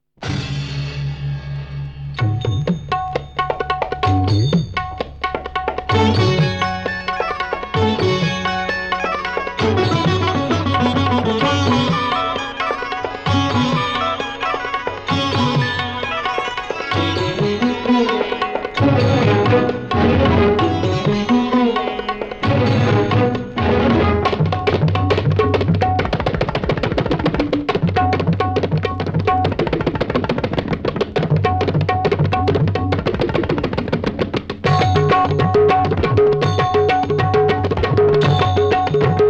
Dance Music; Elaan

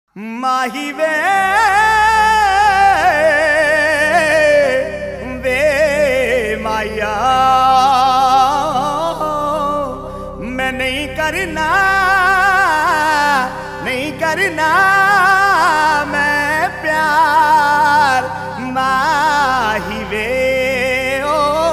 Sad Version

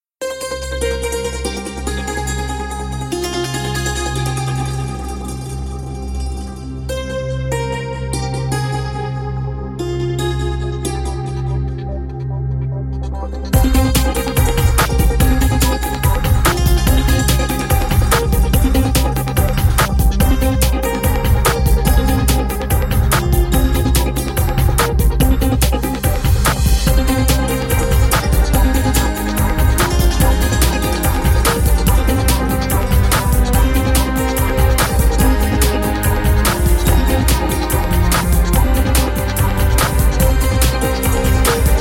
The Flow of Water Instrumental

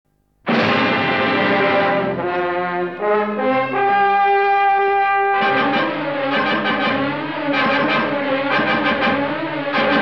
Theme Instrumental; Anita

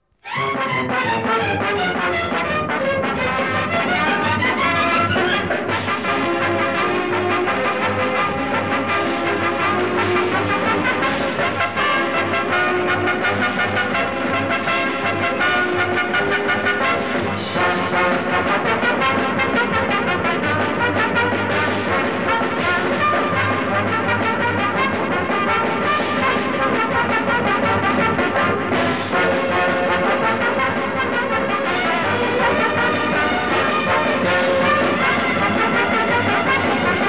Can Can Music Instrumental

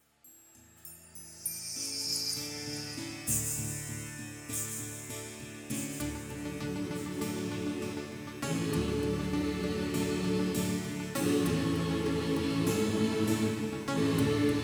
Title Music

